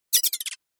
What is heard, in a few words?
Falling Video Game